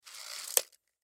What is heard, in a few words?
Tape Measure Tape Retract; Hand Tool; Industry; Hand Tools; Sound Effects:Tools; Blastwave